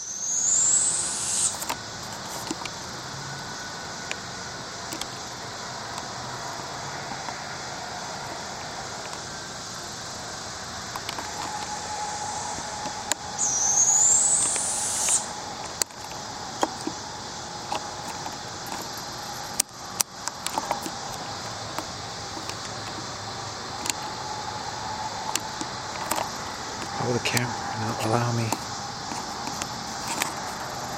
owls, bicycle trail; wildlife; birds

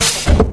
node up; unreal tournament 2004; fav UT sounds

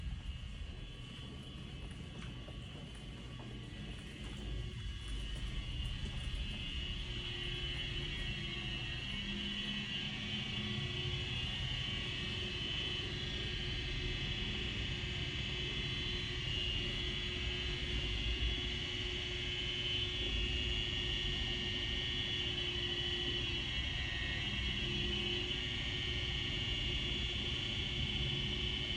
grinding pump; Campus grounds; Disasters; BH140MR

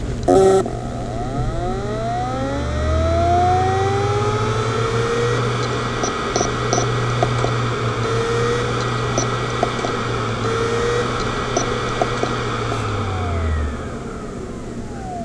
spin try, hd sounds; desktop; mac disasters

